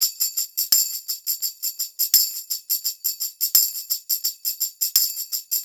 085 Hip Hop Tambourine, Percussion; Digidesign; Pro Tools; Pro Tools Essential Loops

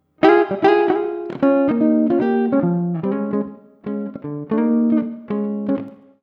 075 NuJazz Guitar Bbmaj, Jazz Guitar Loops; Pro Tools Essential Loops; Digidesign

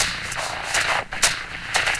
120 Dance E-Perc; Percussion; Pro Tools Essential Loops; Digidesign

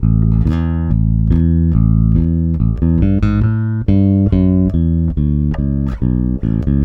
Country Bass Chorus; Chorus Sec 2; Digidesign; Pro Tools Essential Loops

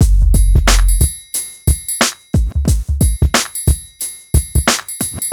Hip Hop Beat, Drums

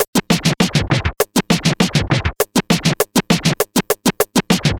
Vinyl Scratch Snare

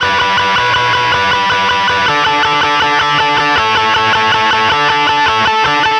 160 Pop Guitar 8th Bb maj, Electric; Pop Rock; Electric 100-160 bpm; Pro Tools Essential Loops; Digidesign

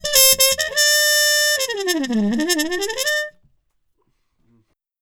095 Acid Jazz Trumpet _Cmaj; Brass; Pro Tools Essential Loops; Digidesign

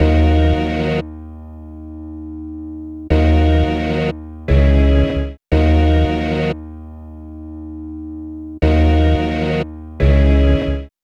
South Hip Hop String Hits Ebm; Digidesign; Pro Tools; Pro Tools Essential Demos; Essential Loop Demos; Demo Session - Hip Hop

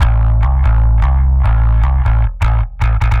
Garage Bass Amin; 150; Dance DJ; Digidesign; Pro Tools; Pro Tools Essential Loops

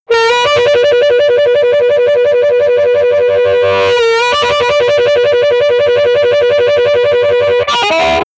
Blues Harm Intro; Digidesign; Pro Tools Essential Loops

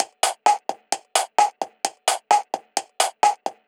130 Dance Perc Fx; Percussion; Pro Tools Essential Loops; Digidesign

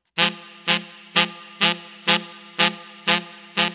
125 Roots Reg Sax Section F; Digidesign; Pro Tools; Pro Tools Essential Demos; Essential Loop Demos; Demo Session - World

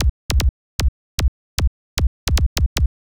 152 Techno Kick; Dance DJ; Drums; Pro Tools Essential Loops; Digidesign

